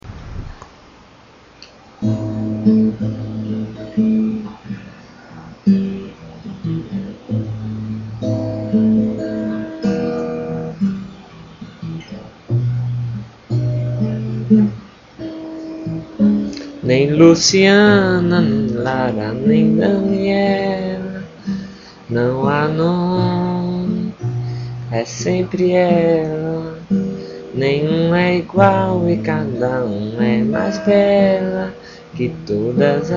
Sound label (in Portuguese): frevo